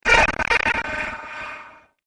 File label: Break The Laser; Laser Sound Sound Effects; science fiction sound effect; star wars / trek style games; Andrew Fleming